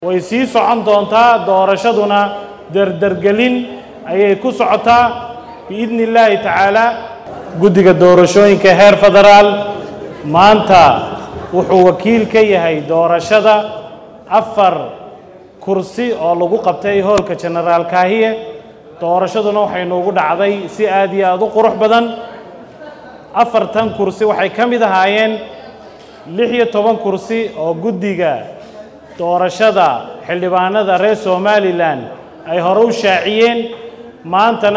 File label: SAX; GUDIGA